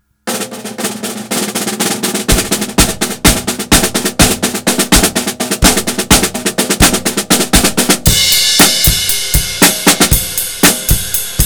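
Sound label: mahogany sample, snare; CraftDrums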